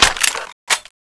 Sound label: clip in, weapons